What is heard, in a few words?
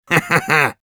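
laugh evil, Russian; Medic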